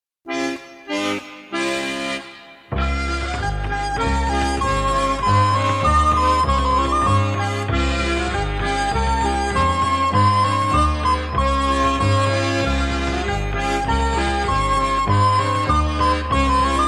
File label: cornish, dance